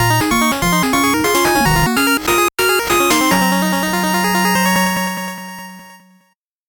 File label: 8bit jingle normal